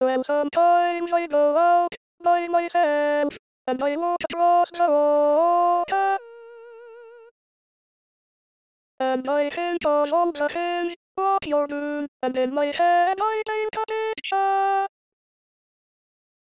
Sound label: Lead SATB Voice